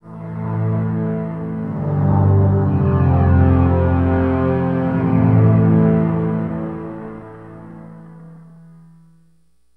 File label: Elektrik Drone, Moving; Sonic Foundry (Sony Creative Software) - Friendly Fire; DroneLoop